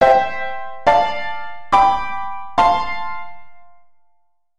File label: c interrupted cadence I-ii-V-IV